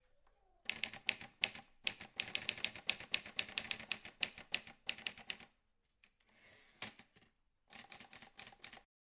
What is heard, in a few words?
Type Machine With Ting